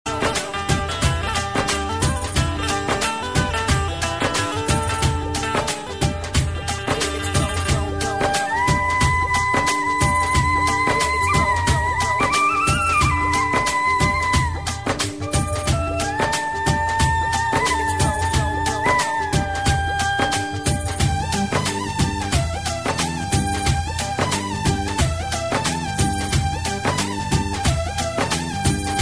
Instrumental

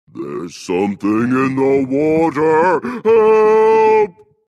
Water; Tequatl

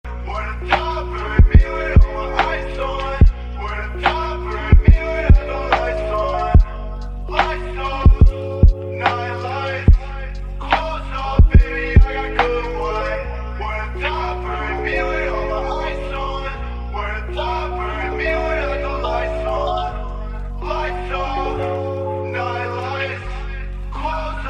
lofi

